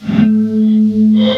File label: gtr; hellcafe